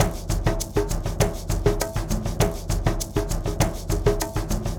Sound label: Ensemble, Latin Percussion